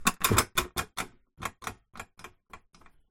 weight scale

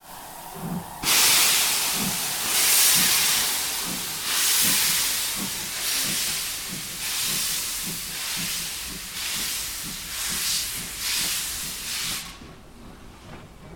locomotive start

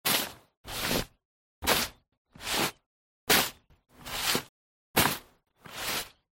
shoveling snow

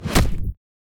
punch light

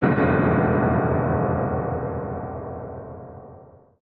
shock

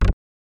beep

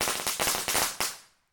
firecracker

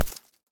snowball hit

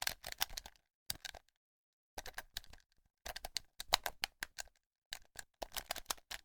game controller

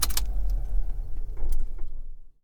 car engine stop

